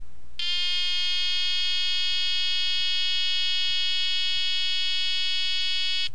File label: beep